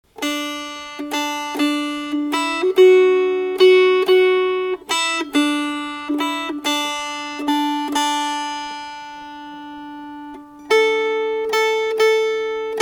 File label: Melody